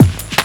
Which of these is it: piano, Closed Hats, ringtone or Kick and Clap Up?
Kick and Clap Up